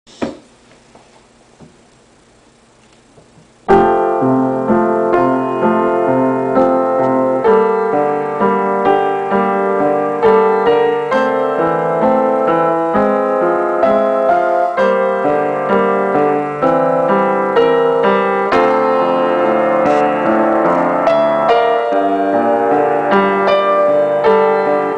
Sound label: piano; cardcaptor sakura